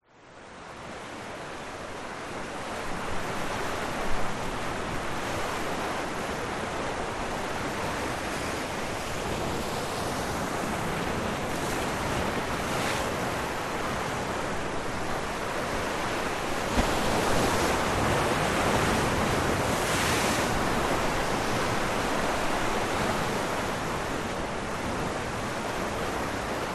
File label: Sea Waves Crashing On Rocks; west wolf